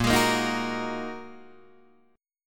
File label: A sharp 7th Suspended 4th Sharp 5th down Guitar Open D